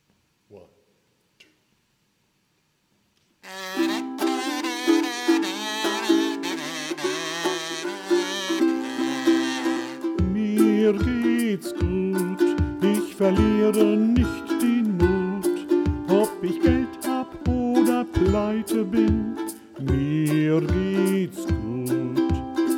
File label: acapella